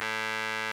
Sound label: RMI; Sonic Reality - Vintage Timetraveler